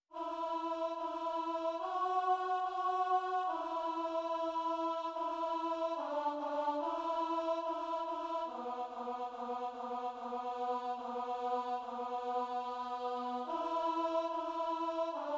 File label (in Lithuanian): Altas Šv Augustino mišios Dievo Avinėli